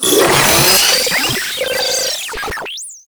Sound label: Practice Orb Activate A; Warframe; The Teacher; Mod Quest